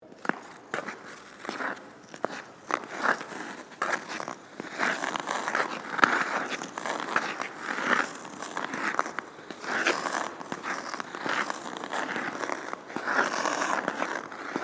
Ice Skates Follow, With, Mic, Circle, Indoor, Ice, Rink; Sports